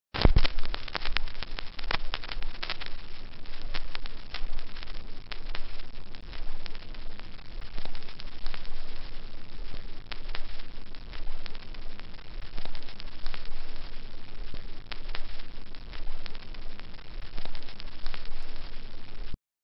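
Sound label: sound of cassette hiss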